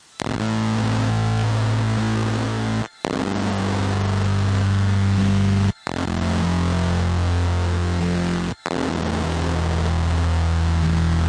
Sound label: 085 commodore 64 moody bassline; SYNTH AND ELECTRONIC LOOPS